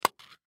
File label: Tin Case Lid Off, Tin Case Lid Off Mint Candy Box; Foley; Blastwave